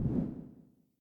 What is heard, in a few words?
UI Slide In; They'll Come from the Moon